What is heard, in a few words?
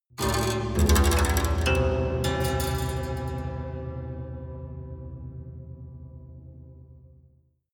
haunted strings sequence low hard